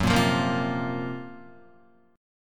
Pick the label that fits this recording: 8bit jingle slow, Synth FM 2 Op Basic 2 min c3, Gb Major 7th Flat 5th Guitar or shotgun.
Gb Major 7th Flat 5th Guitar